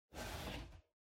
Cardboard Box Far Open; ダンボール; 遠い 開ける; 梱包材

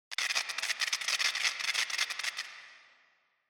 Electric Shock, Reverb Long

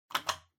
Doorbell Mech Tone Button; ドアベル 機械式 トーン; ボタン

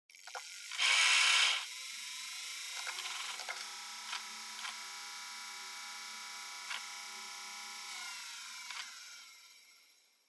Portable MD Driving Noise Seek

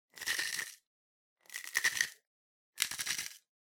Spice Mill Salt

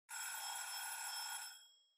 Kitchen Timer Far Alarm Mid

